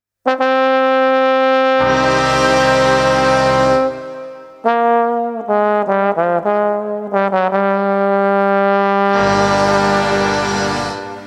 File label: Polka